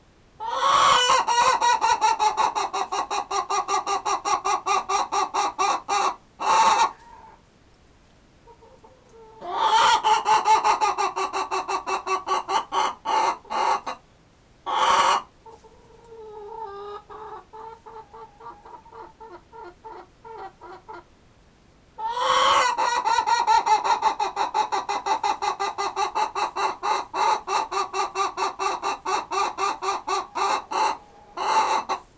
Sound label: chicken noises